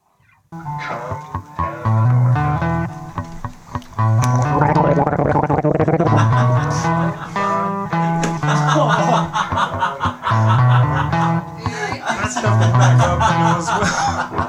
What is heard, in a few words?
Rock Star Gargle